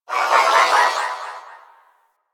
Exterior Distant Space Calls; Warframe; Rail Jack

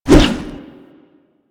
Shield Swing; Warframe; Grineer

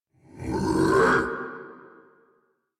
Attack Effort; Warframe; Dax Heavy; en

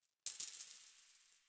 Coin Drop

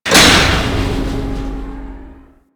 Shipyards Sand Blaster Start; Ambience; Props; Warframe; Grineer Shipyards

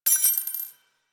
Belt Fed Rifle Links; Warframe; Tenno; Frumentarius

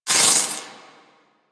Sword Elemental Electricity Whoosh; Warframe; Teshin; Weapons

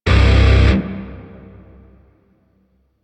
Shawzin Min Chord A; Grineer; DE; Warframe

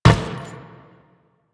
footstep metal; Step Metal, Footsteps; Warframe; Teshin

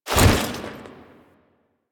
Foley Jump; Warframe; Kahl

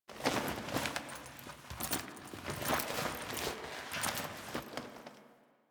Cloth Movement; Warframe; Quests; The New War; Drifter; Foley

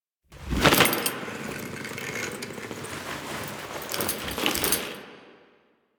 First Death Foley; Enemies; Finishers; Drifter; Warframe; Duviri; Dax

